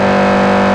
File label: TUBE; PC Gamer 49